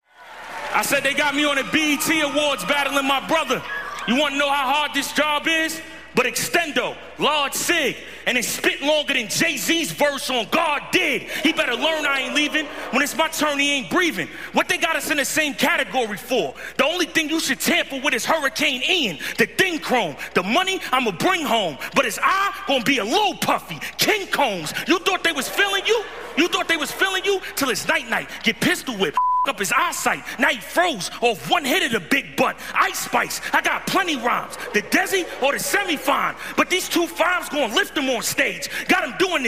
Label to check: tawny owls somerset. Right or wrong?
Wrong